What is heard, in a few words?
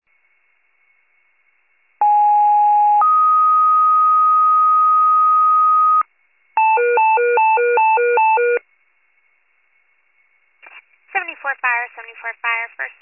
FIRE pager alert